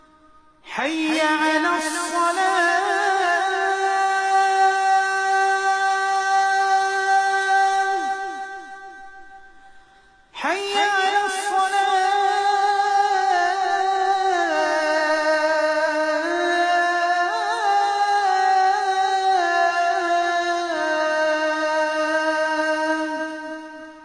Azan Abu Dhabi

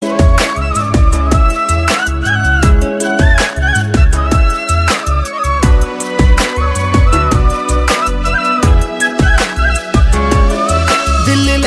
Punjabi

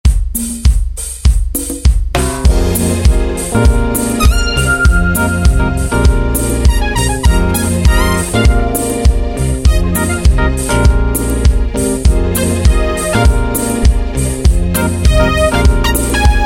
ethiopian

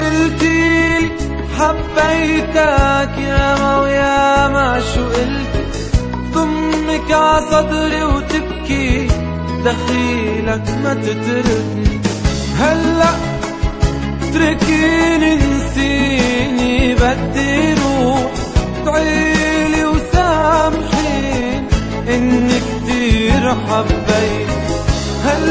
Sad Arabic Song

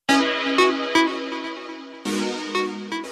Sms

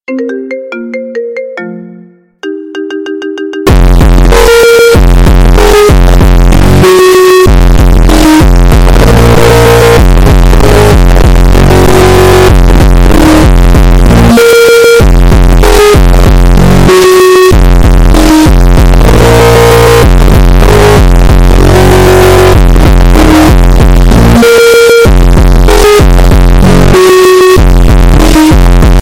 Bass Boost; Deadpool

